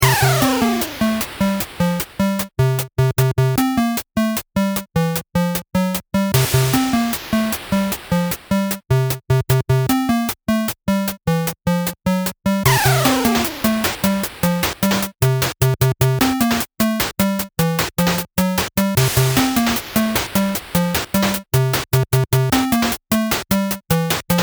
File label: chiptune